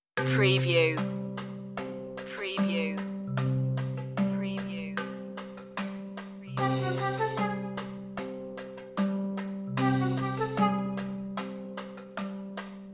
no strings

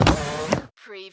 Servo Panel Sound - Servo

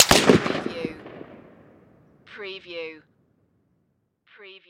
Rifle Single Close

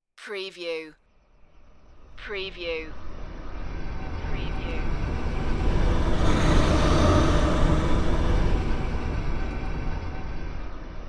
dark force flybys

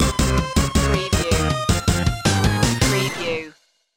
retro video game racing rock stinger

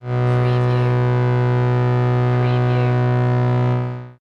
Energy transfer

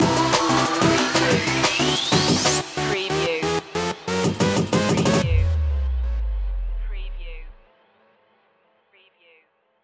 night drive stinger

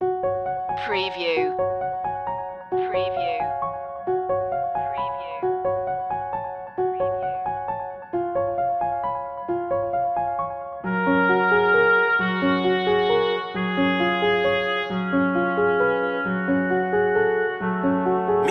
upbeat turning sinister

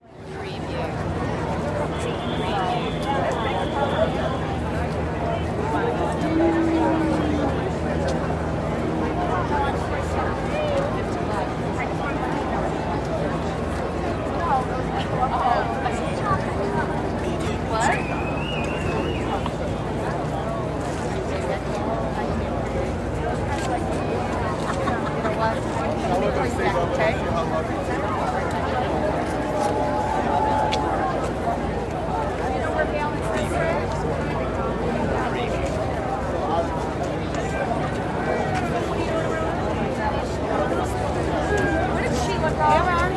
Crowd Walla Outdoor

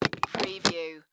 Coffee Pot Insert

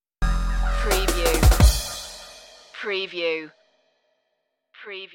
new dnb dream stinger

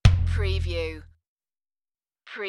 Impact Tom

